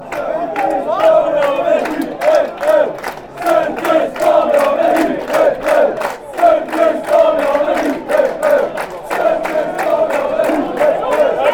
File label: barmy army fanchants; tony pulis